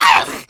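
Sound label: spitter pain short, zombie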